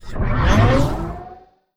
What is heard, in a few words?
sfx science ship moving out; Stellaris; nemesis